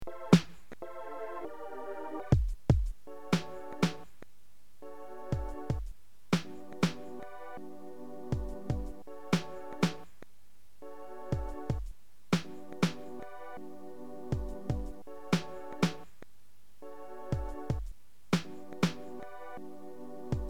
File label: beat